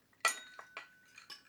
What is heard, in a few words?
ambience room butchery working layer